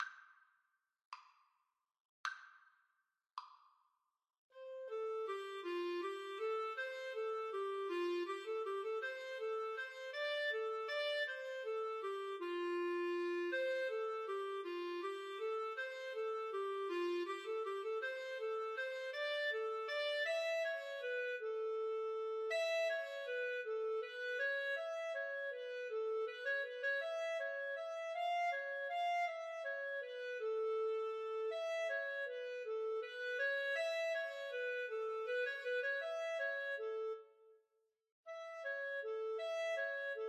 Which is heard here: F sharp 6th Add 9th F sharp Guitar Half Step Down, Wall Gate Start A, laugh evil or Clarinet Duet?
Clarinet Duet